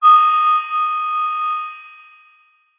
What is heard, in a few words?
science fiction radio receiver transmission interference burst annoying feedback